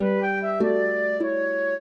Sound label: trio, flute harp; Mozart